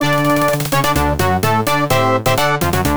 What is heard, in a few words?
VS Victory; Bomber Man; X68000